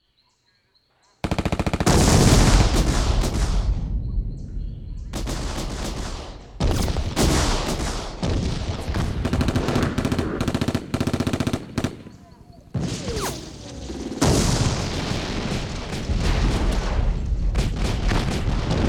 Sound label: Military Battle Vietnam